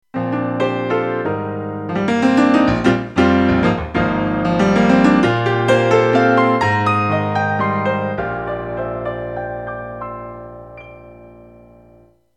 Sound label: Piano